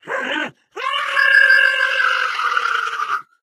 ballbot jump

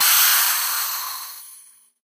sentry upgrading steam, weapons; tf

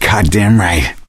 positive noise, gambler, survivor voice; source engine; left 4 dead 2

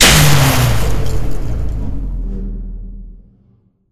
light power off; Source Engine; Portal 2

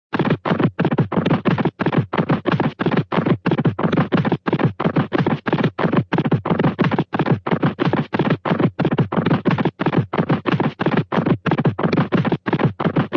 horse, cartoon, thud gallop

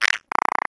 uh oh; player

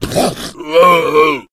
boomer shoved

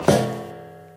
metal grate, footsteps

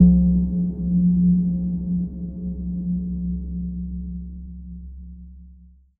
editor blips; Source Engine; Portal 2

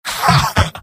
laugh short; scout; mvm

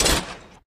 broken turret locked into place; Source Engine; Portal 2

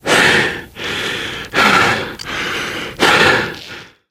exertion minor, biker; source engine; left 4 dead 2

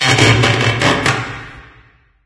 amb wood creak, ambient organic; video games; source engine; portal2

